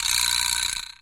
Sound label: vibraslap; Misc Percussion